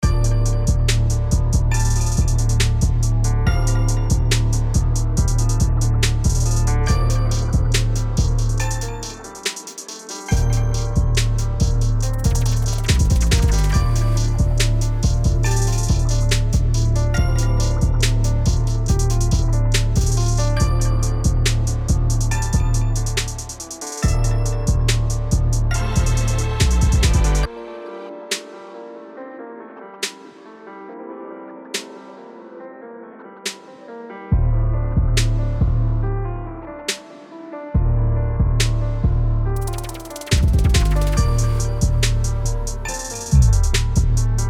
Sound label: Trapped Dmin bpm140